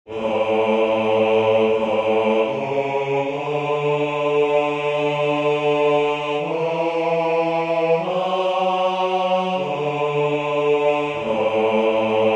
Rappelle toi Jeanne basse

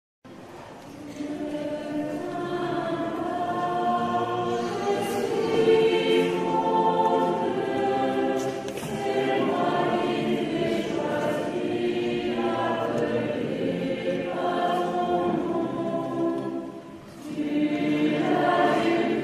Ne crains pas Choeur inter scouts; Du Jonchay; de Tours

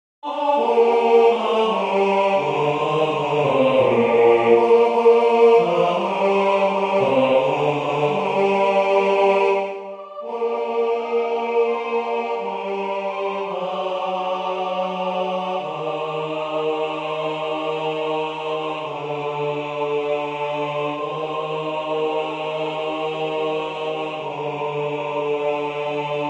O sainte Jeanne de Domremy basse; anonyme

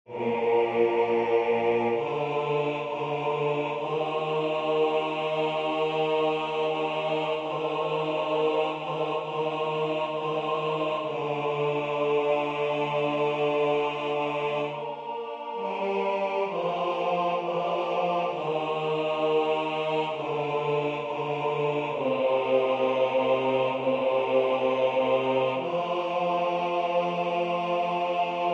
Prosternez vous basse

